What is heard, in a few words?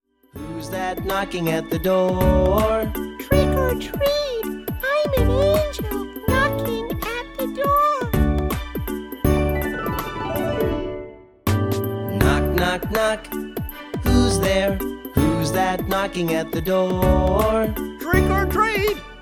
Knock Knock Knock; spooky